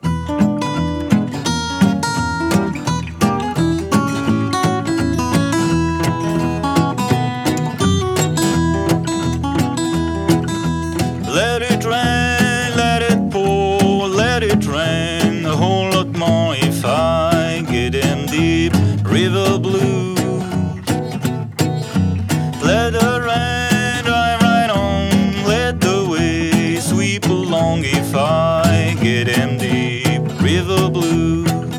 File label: blues; Deep river blues